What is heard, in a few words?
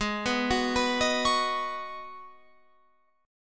G sharp Minor 11th G sharp Guitar Open F, chord; G#m11 arpeggiated